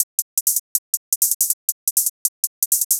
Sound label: tight hi hat groove 160bpm; 2 bars